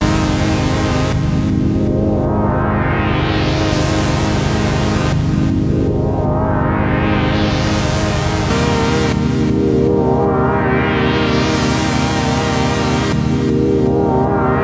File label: Sequential; Sequential Take5